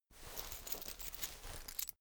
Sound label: key ring large pocket fetch